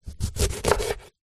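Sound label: lemon or lime sounds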